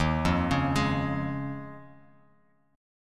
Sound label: D sharp 7th Suspended 2nd Suspended 4th, Bass Standard; arpeggiated; 11,8,11,13; D#7sus2sus4; JGuitar